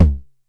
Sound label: 909 TOMS; Wizoo - Platinum 24 Electronic; 909 TOMS P1